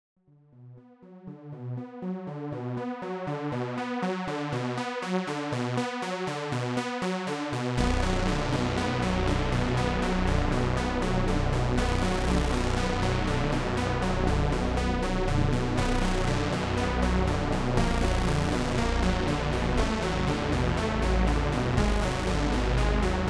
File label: menu, music; Mindustry